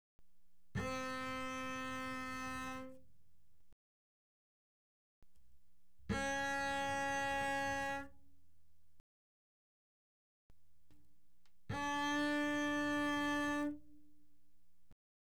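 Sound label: Bass arco fortissimo sul D C4 D4, doublebass; Strings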